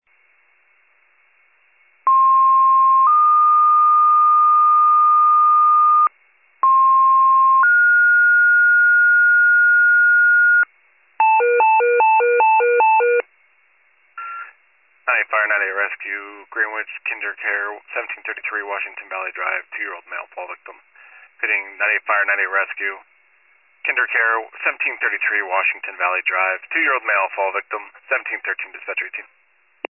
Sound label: fire, pager alert